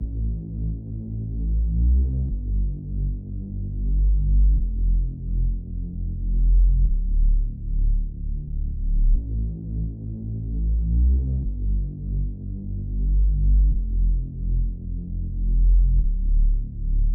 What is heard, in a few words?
105 BPM A Min Bass; Cymatics; Gems Free Teaser Pack; Cymatics Gems Vol 6; Toronto